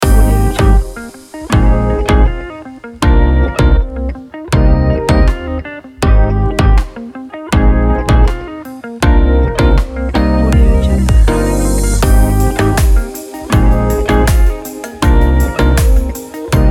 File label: happy day